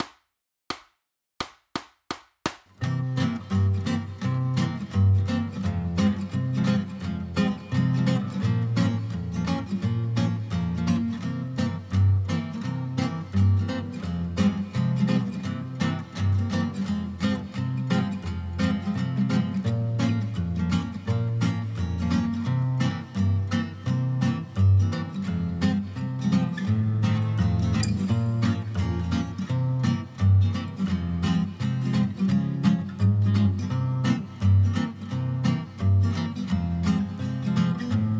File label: backing track